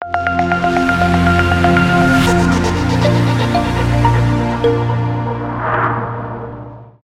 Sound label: Tech Logo Intro